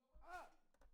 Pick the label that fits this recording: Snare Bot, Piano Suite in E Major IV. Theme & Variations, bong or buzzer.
Snare Bot